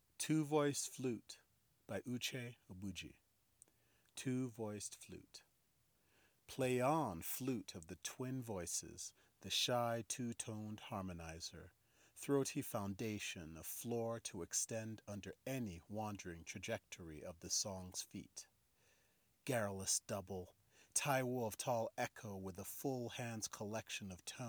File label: Two Voiced Flute